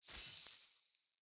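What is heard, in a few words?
Staff Caster Projectile Destroy; Weapons; Player; Staves; Starter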